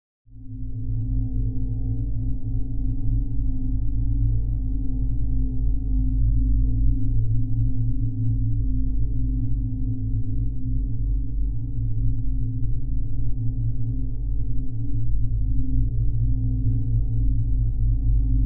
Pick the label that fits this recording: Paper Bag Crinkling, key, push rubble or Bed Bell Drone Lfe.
Bed Bell Drone Lfe